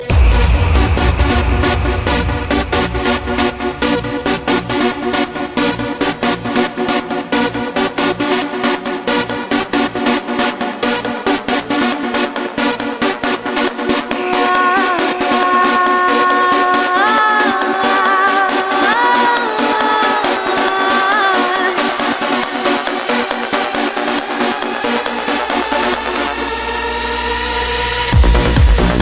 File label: pump trance